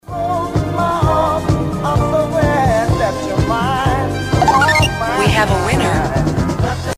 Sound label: tone